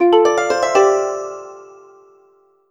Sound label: call test audio